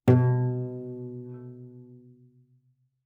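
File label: Cello pizz fortissimo sul G B2; MIS Pitches - 2014; Strings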